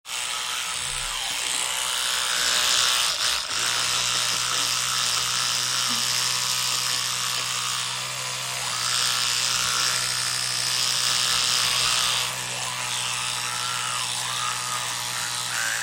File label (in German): Zaehneputzen